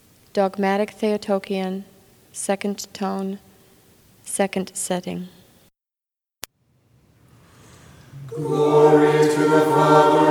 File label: Dogmatic Theotokion Tone 2; Setting 2